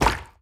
bounce small; fistula

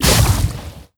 beast lava ball splash

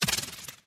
teratoma bounce medium

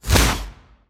bighorn big hole close

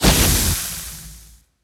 beast fire ring burn

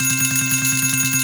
win loop, slot; greed; slot eyes

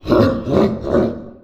wall shots start; finalmother

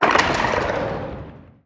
ghost fade; forsaken

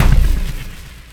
mushroom poof

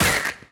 death; horizontalrunner; horf

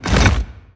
bighorn small hole open

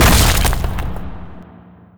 hellboss ground pound

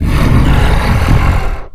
growl

